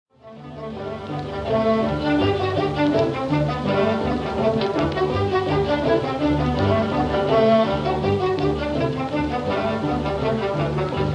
folk song for orchestra or theater orchestra; Grainger; Molly on the Shore

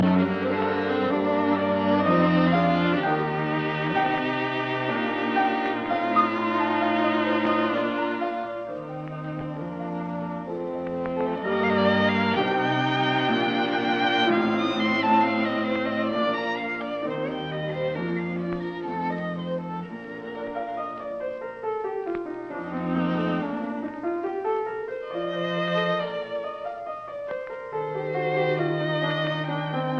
Piano Quartet in G minor, Andante; Piano Quartet No 1 in G minor K 478; Budapest Quartet

